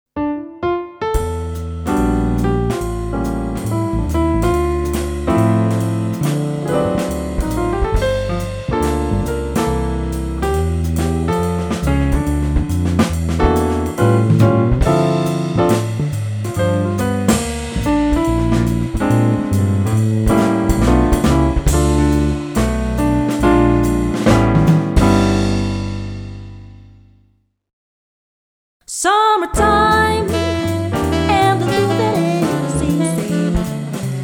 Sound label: Jazz Medley